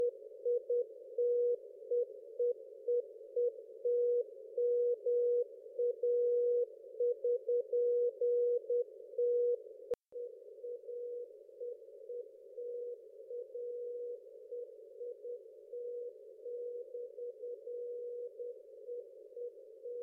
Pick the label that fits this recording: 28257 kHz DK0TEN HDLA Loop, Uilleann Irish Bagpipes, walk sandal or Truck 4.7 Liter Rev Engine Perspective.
28257 kHz DK0TEN HDLA Loop